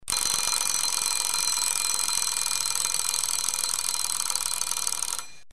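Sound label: bell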